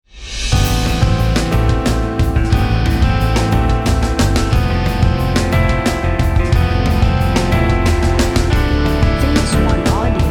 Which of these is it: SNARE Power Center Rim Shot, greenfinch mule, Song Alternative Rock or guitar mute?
Song Alternative Rock